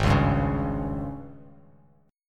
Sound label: G sharp Major 7th Suspended 4th; strummed; 4,4,5,6